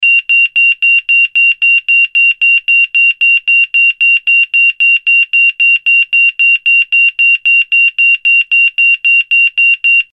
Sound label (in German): Ton